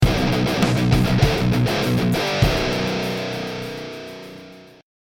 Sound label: chopper alert